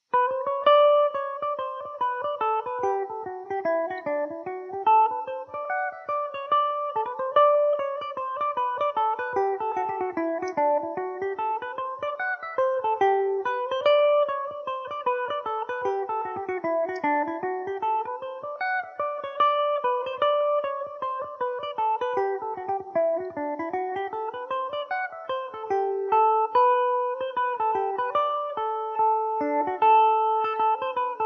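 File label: hornpipe G major revelation, irish mandolin; japanese hornpipe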